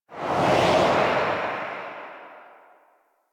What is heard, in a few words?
Wraith Reaper Travel Whoosh Right; Warframe